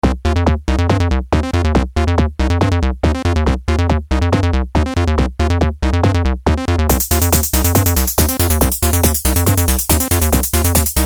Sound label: ds 10 seq